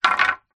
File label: boat wooden oars x2 old down on hard ground